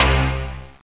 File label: sq-sound, keyboards; Zoom - Release 2; Active Software